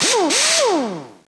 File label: airwrench; push